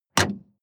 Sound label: sports car ext bonnet close